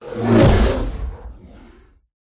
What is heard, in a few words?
whoosh; Audio Mangler